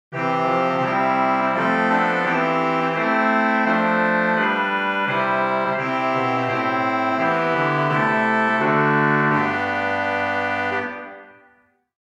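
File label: Great Trumpet 8, organ stops; Hauptwerk; St Annes Moseley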